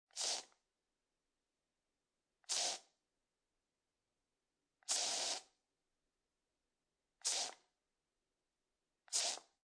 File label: Foley, Aerosol Can Spray, Multiple Short Length Sprays; Spraying